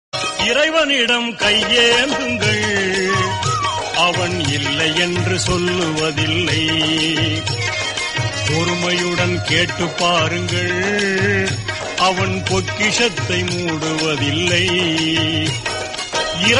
tamil islamic song

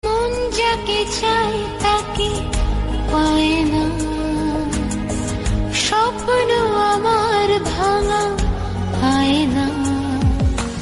sad bengali

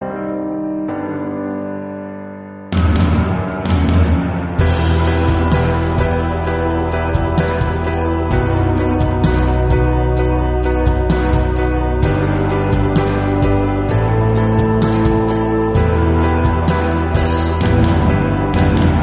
guitar instrumental

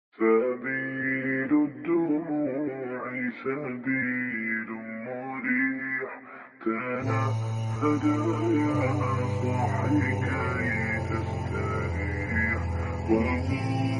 bgm theme

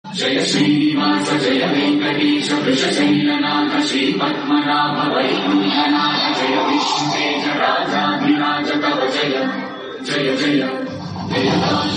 devotional tamil

